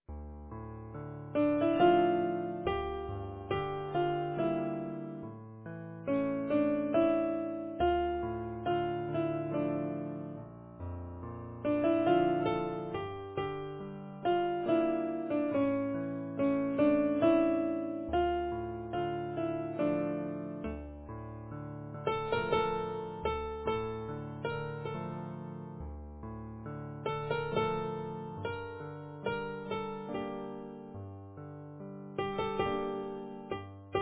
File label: easy piano